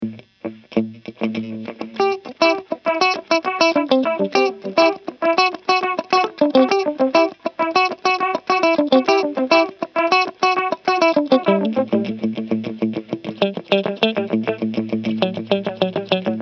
mod

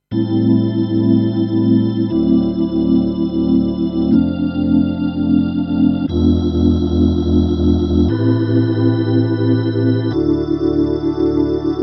E Organ; EHX Lester K

